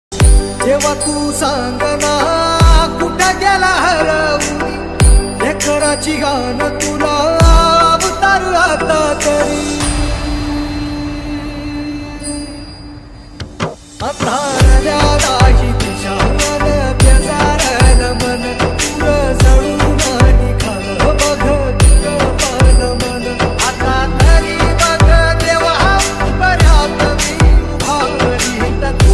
marathi ringtone

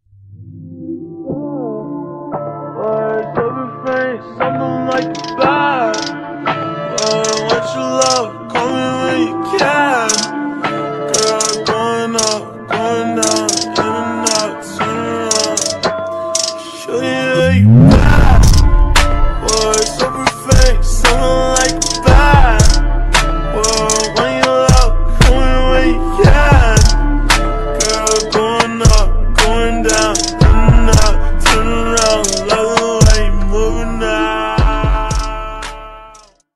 ringtone music beats

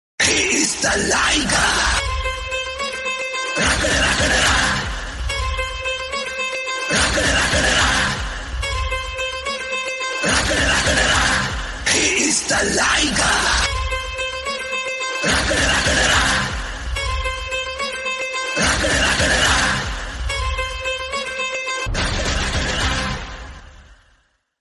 movie bgm ringtone; liger

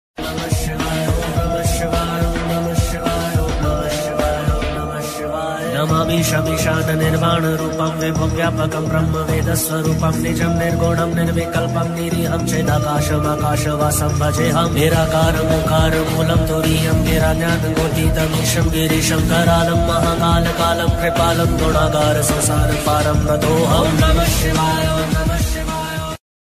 Om Namah Shivay dj Remix; bhakti ringtone

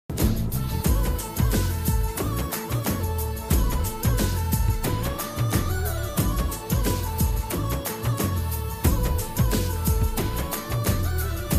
instrumental

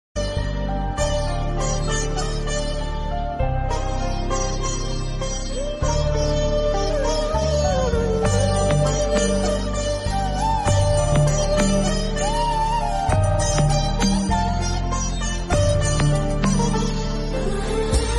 flute ringtone; m kumaran son of mahalakshmi

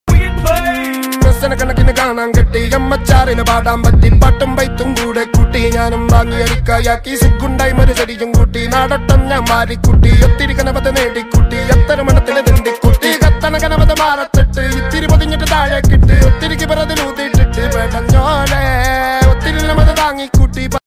malabari remix ringtone

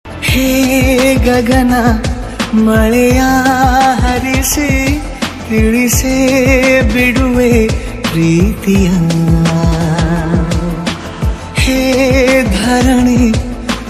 kannada ringtone love bgm ringtone; krishnam pranaya sakhi

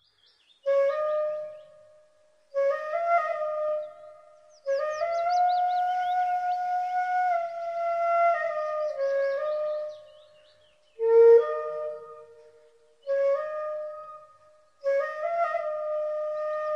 bgm flute flute cover; krishna; karthikeya 2

